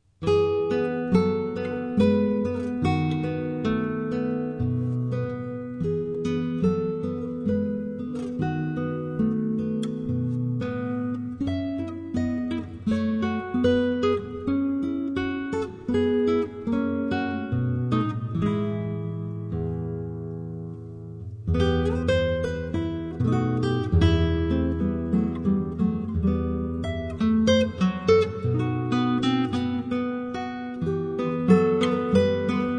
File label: Guitar; Lagrima